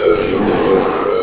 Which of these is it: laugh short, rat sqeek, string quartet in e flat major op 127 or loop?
loop